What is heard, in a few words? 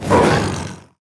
enemy warlock summon spawn